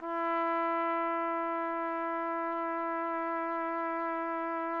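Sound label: trumpet; Roland - LA Composer vol. 3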